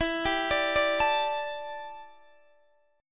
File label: E Minor 11th guitar chord, DADGAD